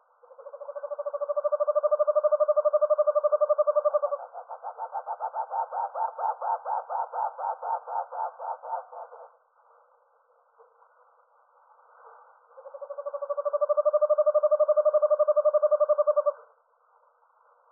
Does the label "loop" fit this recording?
Yes